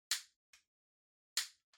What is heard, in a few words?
Lighter Far Click